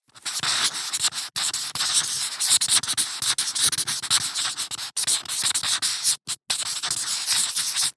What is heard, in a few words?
Handwriting Marker; Edding; soundbits